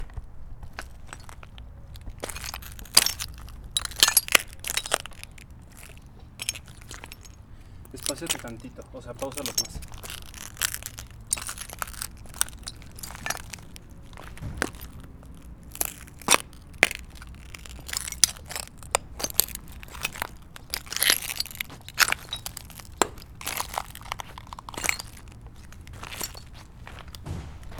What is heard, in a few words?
Walking Broken Glass